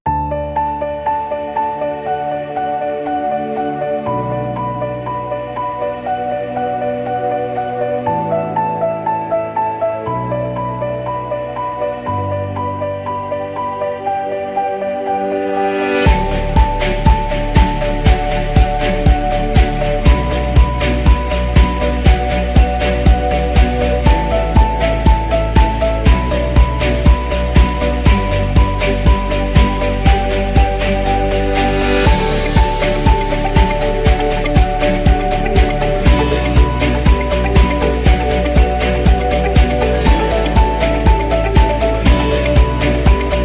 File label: Motivation Corporate